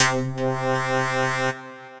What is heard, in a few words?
Synth Keys; Os Car Crues